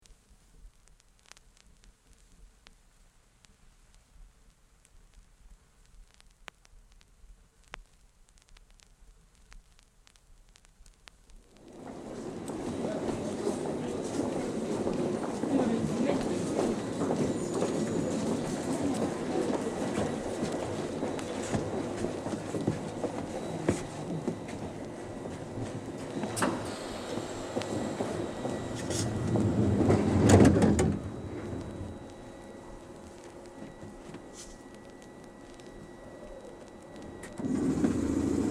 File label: Train boarded, doors close, runs